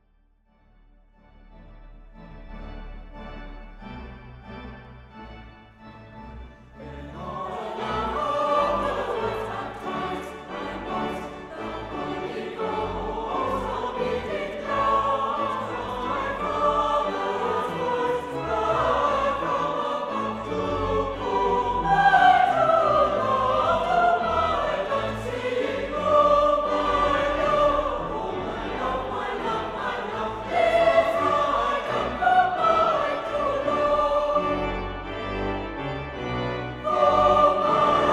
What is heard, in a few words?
Church Choir; Tomorrow shall be my dancing day